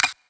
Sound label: Clap, Dubstep One-Shot Drums; Prime Loops; Dubstep Illusions